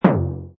Cartoon Tymp Bend Down, Tympani Bend Down, low boing; Comedy/Cartoon; Funny Sounds; Lynne Music